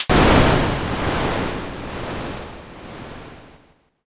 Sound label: thunder